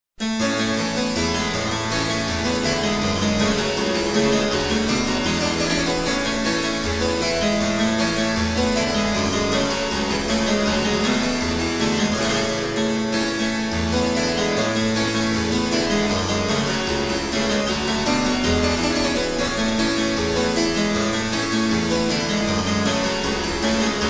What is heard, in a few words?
barricades, Baroque